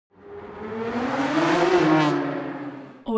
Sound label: transportation racing car f1 drive by short